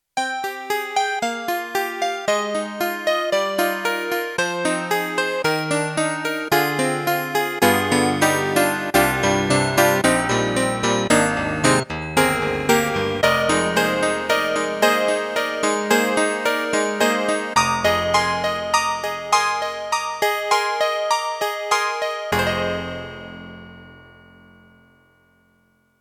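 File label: YAMAHA MU1000EX New House Piano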